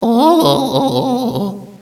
ohhhhhh, Echo (inside caves, underground ect.); DS; ML BOWSERSINSIDE; Mario

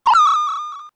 enemy spike hit; SNES; SMRPG

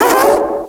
ehem, Echo (inside caves, underground ect.); DS; ML BOWSERS INSIDE; Fawful

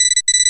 countdown timer critical; N64; YOSHISISLAND

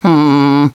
hmm; DS; ML BOWSERS INSIDE; Luigi

